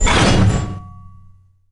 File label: armor